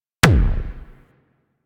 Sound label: Arch Gun Primary Beam Punch, Weapons; Warframe; Archwing; Hildryn